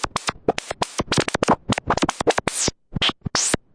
noise Modular, noise sweeps

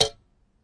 spring pluck, Typewriter Kit

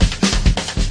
133 rims and snares parade, DRUM LOOPS and BREAKS

